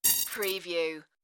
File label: digital slide glitch